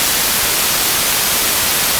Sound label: static